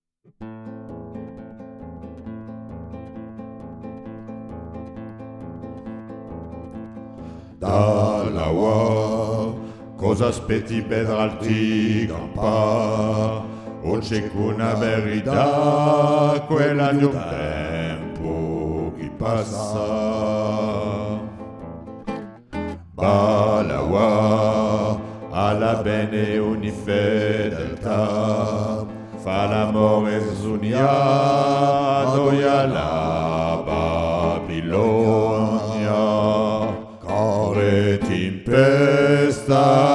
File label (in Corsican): CORRE TIMPESTA BASSE